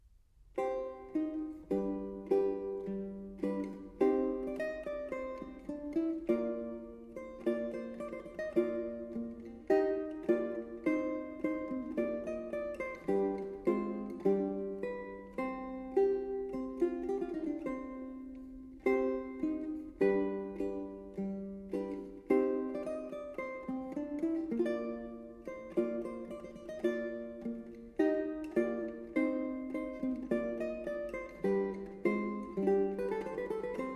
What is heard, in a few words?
Pavana (4 course guitar)